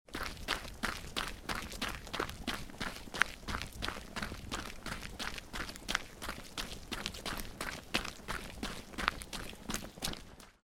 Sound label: Forest; Forest Track 4